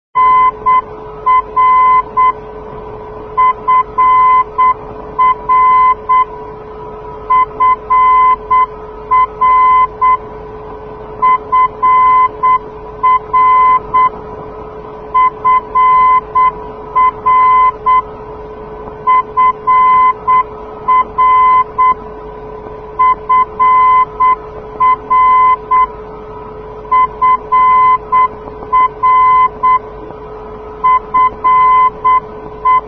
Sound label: ndb frankfurt fr-297; europe